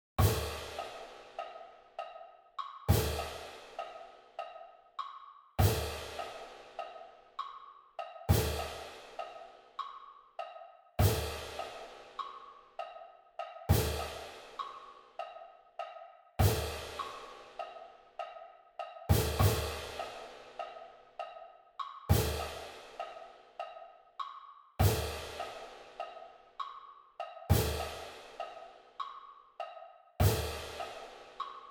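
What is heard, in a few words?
timing exercise, drum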